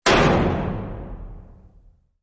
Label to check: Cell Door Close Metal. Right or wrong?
Right